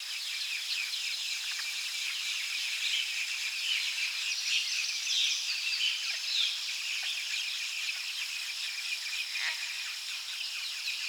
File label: Forest Day; ambiance; SFX Producer